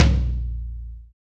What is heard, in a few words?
TOM TOM